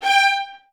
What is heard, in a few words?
G4, Advanced Orchestra; Best Service